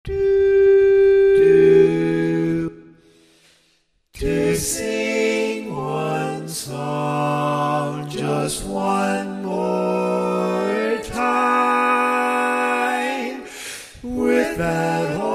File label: Bass, Barbershop Tags; Short Version